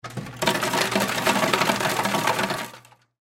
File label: Ice Machine, Ice Machine Dispense Lots Of Ice; Household; Food